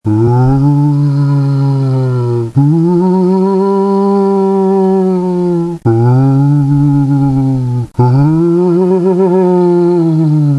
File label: Moaning Ghoul